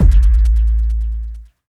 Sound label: END, Tekno Industrial; East West